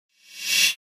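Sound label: Pop Up Electric Buzz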